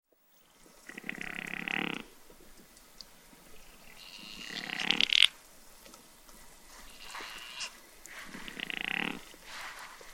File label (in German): Gams Rupicapra rupicapra Meckern